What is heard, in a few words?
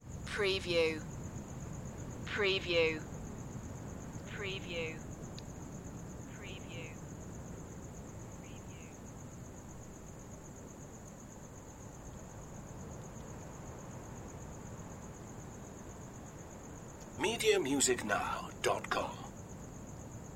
rural night ambience